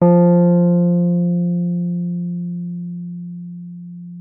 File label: Wurlitzer F3; Xsample VOL-1; 90 s Sample CDs; WURLITZER B